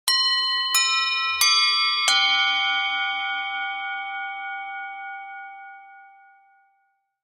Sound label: door bell sound